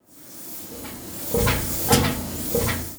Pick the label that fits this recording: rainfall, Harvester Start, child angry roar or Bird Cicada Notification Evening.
Harvester Start